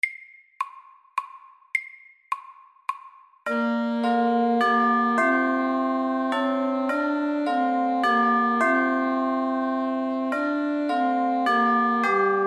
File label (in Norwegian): Klokkekling MEDIUM 105